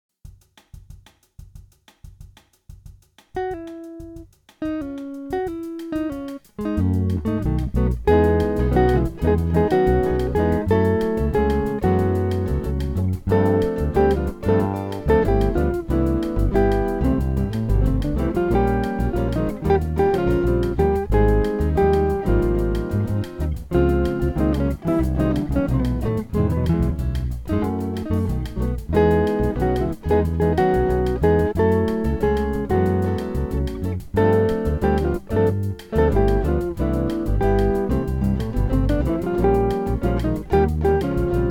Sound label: warm like us guitar ensemble